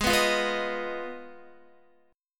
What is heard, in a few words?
Ab Minor 6th, Guitar; strummed; x,11,9,10,11,10; 4E,4A,5D,5G,5C,6F